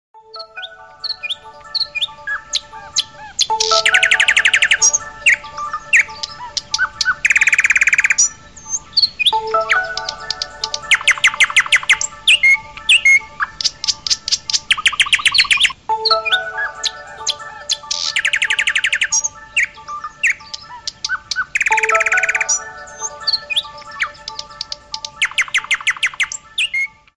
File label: Nice Wake Up Alarm Ringtone; ringtones